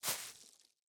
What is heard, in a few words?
Step Grass; Game rip; MiSide